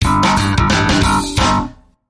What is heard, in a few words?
Bouncing Bass Sting